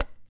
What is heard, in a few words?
CHARANGO FX